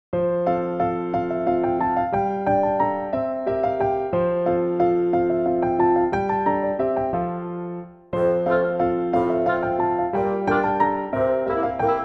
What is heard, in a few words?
Childish Play, KIDS 귀여운